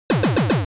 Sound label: Pipe; wario land